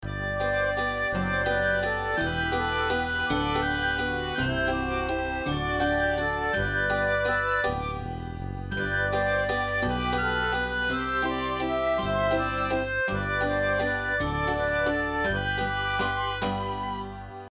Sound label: LOOP Childrens music